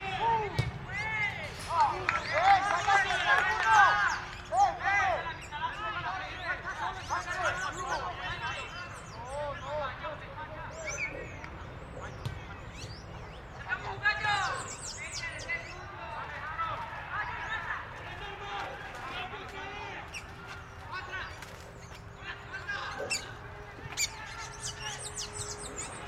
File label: City Living Outdoor Sports Boys Playing Soccer Yelling in Spanish Birds; Daniel Vasquez